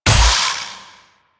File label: Assassinate Enemy Fusion; Warframe; Infested Eris; Encounters